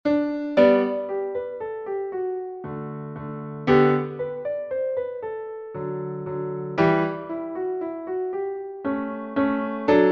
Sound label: Menuetto in G major